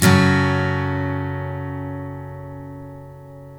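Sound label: steel F# minor up, CHORDS STEEL, Guitars; Spectrasonics; Hans Zimmer Guitars VOL-1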